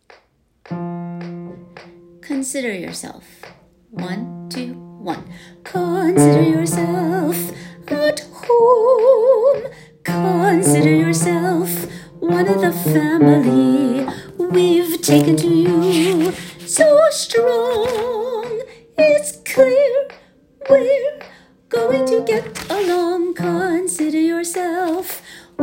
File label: unison; Broadway Shabbat